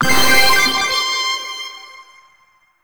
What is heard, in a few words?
Final Reveal; Peely Pix; Warframe; Nineteeen Ninety Nine; Deep Archimedia